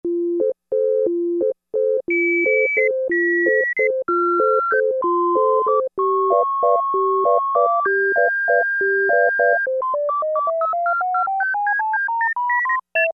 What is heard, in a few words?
Harmonica, Roland Juno-60; Factory presets; Factory presets group 2